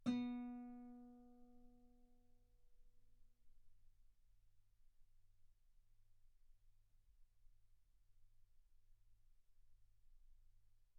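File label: Guitar ff B string B3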